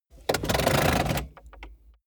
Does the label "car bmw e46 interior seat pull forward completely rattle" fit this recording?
Yes